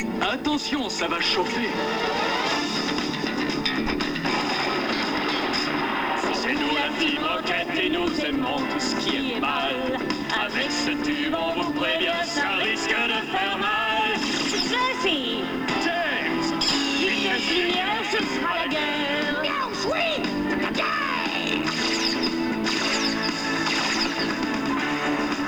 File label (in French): Motto Karaoke; Le Motto de l'épisode 42 est une version Karaoké